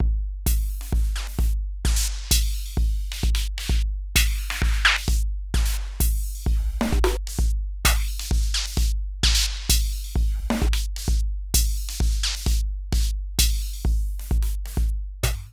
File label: Erica Synths Sample Drum High Pass